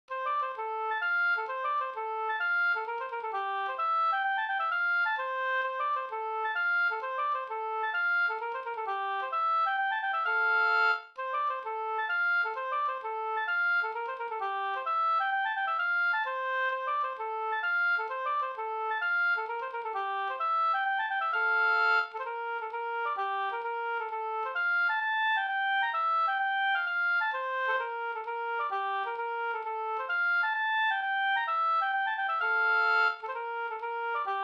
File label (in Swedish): hambopolska